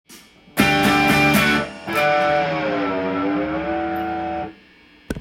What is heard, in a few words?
bass